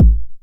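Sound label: 909 BD tape, kick drum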